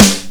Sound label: shiny steel snare drum g key; snare drum samples